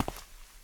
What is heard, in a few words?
concrete; firefight